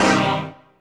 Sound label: dance chord